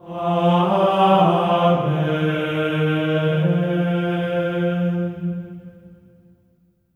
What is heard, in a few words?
AMEN F4, Symphony of Voices; Spectrasonics; GR AMEN